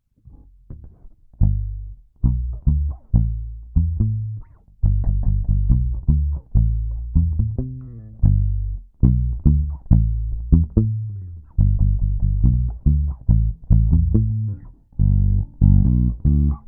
Fodera Deep Reggae

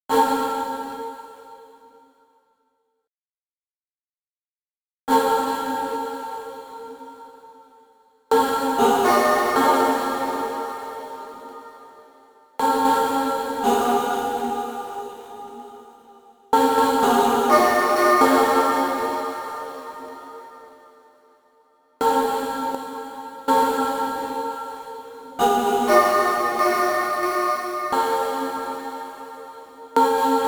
TastyChips GR 1 Vocal Snipped Transformed

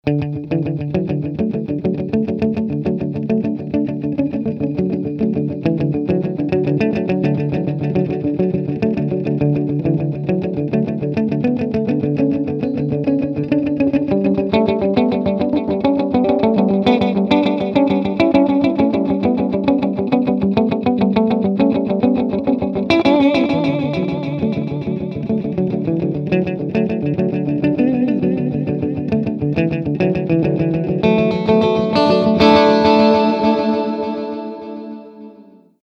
Multi Tap

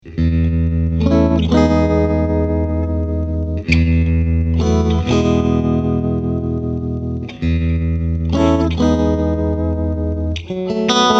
Vibrato

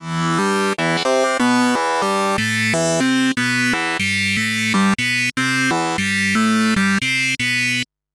Ableton Wavetable Funk Solo

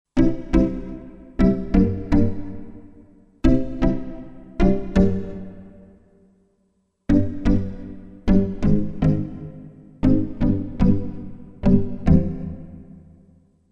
Pizz String; Cypher2 ST

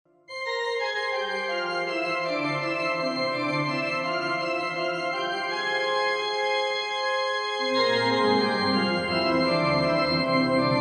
Orchestral Colors Massive Organ

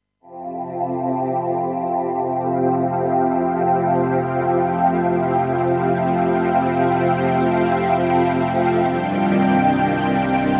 Pad

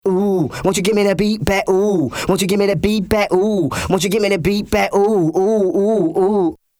Chandler TG 1 Vox THD LIM

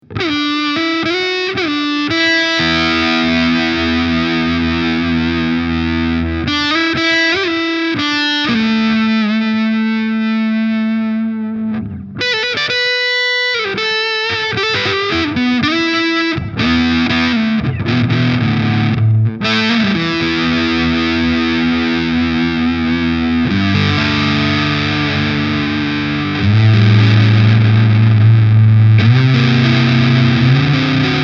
Big Muff Sustain Tone; Rams Head

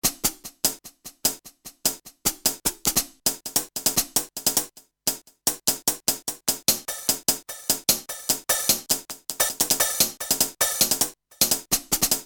Kawai XD-5 Hi-Hat Single

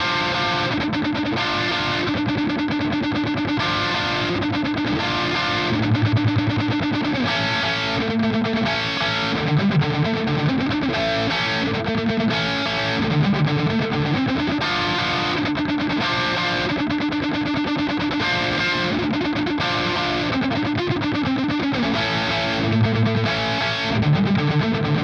Gain Both Pickup; Sterling By Music Man Richardson Signature

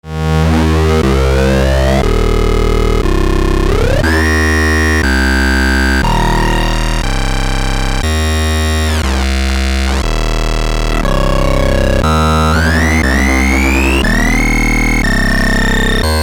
sync fm

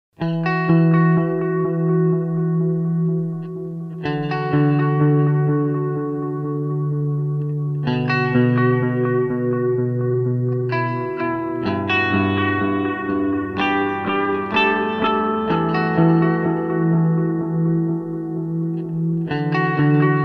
Strymon El Capistan dark old wobbling tape

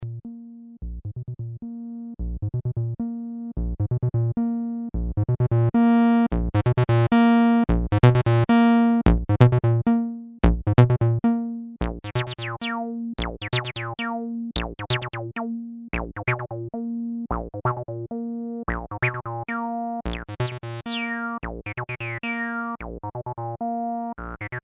Behringer TD 3 Accent Slide Test

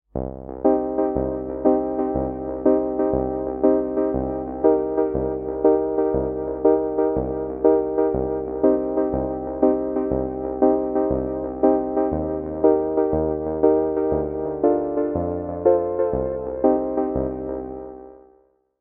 Siel Orchestra Piano

